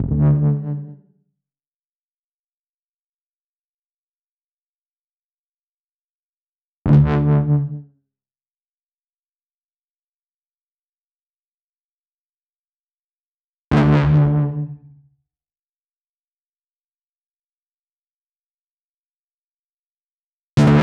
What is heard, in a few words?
GuitarSynth DRY 140BPM, Dminor; Unlooped; Vengeance Samples; Beast Kit